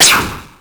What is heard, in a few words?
Shoot, Retro Games Sound FX